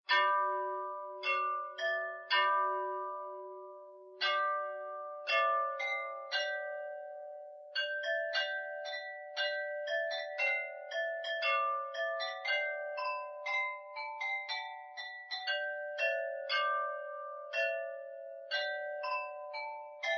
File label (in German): Glockenspiel; Steiger